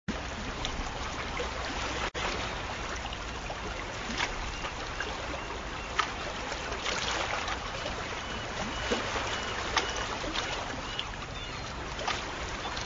Beach Waves

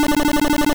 Psybeam Loop; sfx; attack moves; pokemon; gen 2; gsc

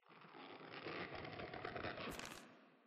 arm lower; Mommy LongLegs

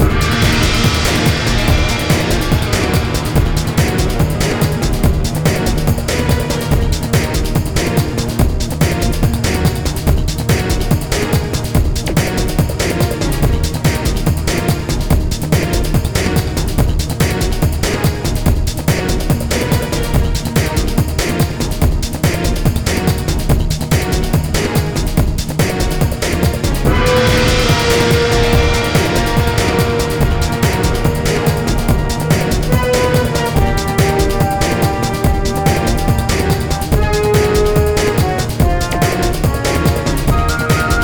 Battle Loop No Vocal; Asterix Obelix XXL 2

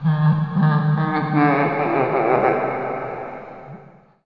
laugh; fredbear

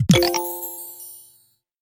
gem add; tempala

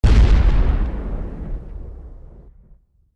Bomb Blast

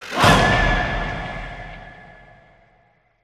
Music Stingers - Battle Stinger

